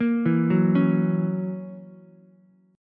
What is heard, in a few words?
Gb 7th Gb down Ukulele, chord; arpeggiated; JGuitar